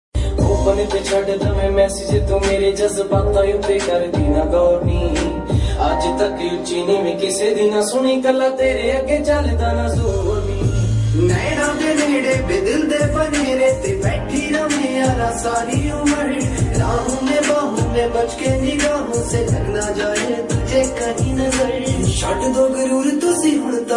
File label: Love Ringtone